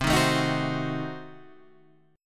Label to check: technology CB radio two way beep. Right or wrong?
Wrong